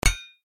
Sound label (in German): graben stein; Crypt of the NecroDancer